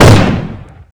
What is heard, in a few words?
shotgun shoot; lethalcompany; zerosiki; slender